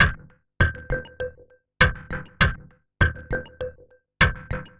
perc turgid, Percussive Loops, 100 bpm; Sample Magic - Transmission-X

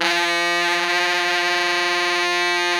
TENOR GROWL F 4, Saxophones; Greytsounds; Philip Wolfe David Crozier's Saxophones

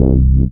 SYN SH, Roland; Sound & Vision - Gigapack I CD 2

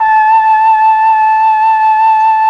FLUT; ILIO; Propeller Island Legendary M400

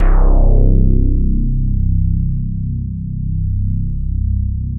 MKS BASS

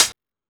Techno D; EMU XL-7

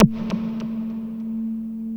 WALL MOOG, Synthy Tonal; Distored Reality

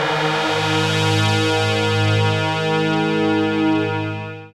SYN STRAN, Dance Synth; Sound & Vision - Gigapack I CD 2

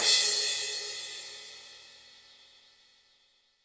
HiHat & Crash, Roland TD-20; HiHats

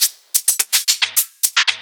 Shakers; Electronisounds

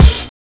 KIT, Dance Mega Drums, HIP

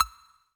Percs, Elektron MachineDrum; SPS1 MKII

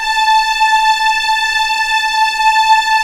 A5 EXP MF, VIOLINS; East West; The Ultimate String Collection

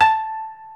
KOTO A4; World Combo

